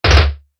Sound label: drop